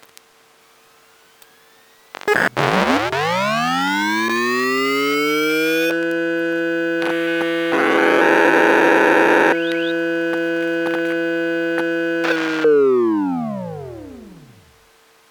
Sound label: seagate cheetah start stop